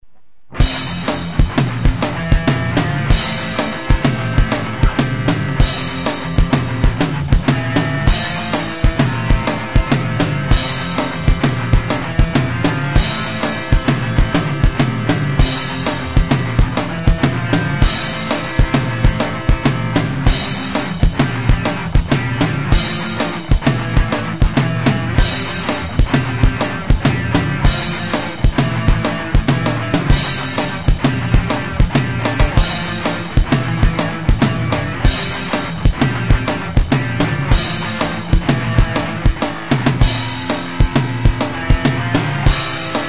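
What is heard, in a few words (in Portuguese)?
instrumental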